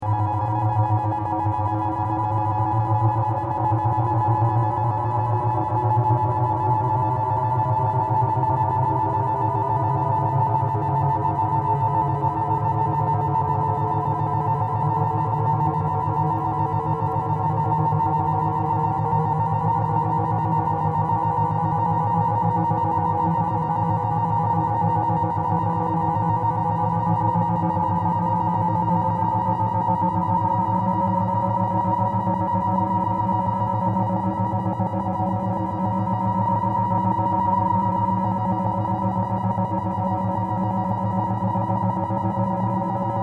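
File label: Weird Modulated Drone With Reverb; analog synth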